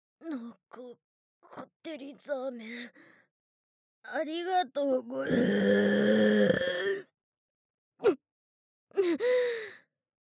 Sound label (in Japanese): ゲップ（中）; ザーメンゲップ集; ザーメンゲップ（中2）